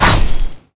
Weapons Sword

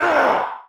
Hit Voice

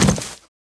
Cloth

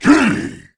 Attack

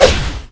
Attack

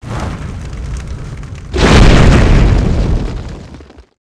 silence Exp

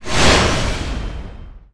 Magic

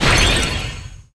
Icecycle Exp

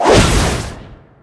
Impact

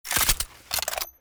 Gun reload

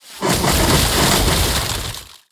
Ice Stome Area

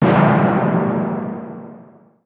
close

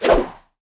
Whoosh

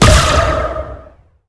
Holly Armor

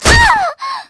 MON DIE

